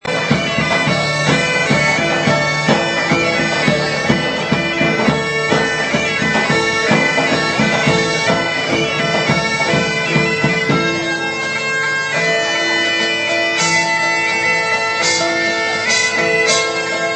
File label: Bagpipes